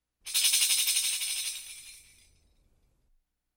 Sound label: Deep Tambourine Rattle